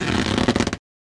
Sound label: Balloon Med Stretch C; balloons